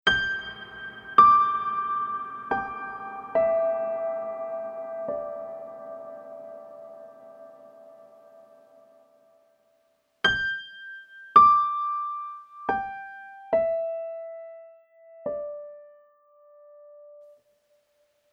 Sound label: piano magic plate